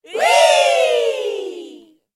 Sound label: wee